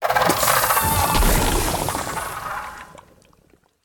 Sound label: scorpion death; smite; npc